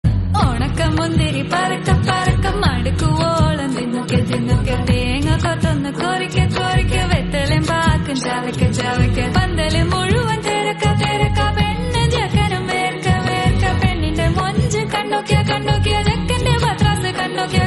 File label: Malayalam Song